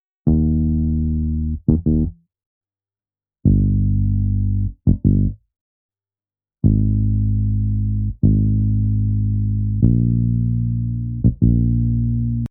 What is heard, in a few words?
bass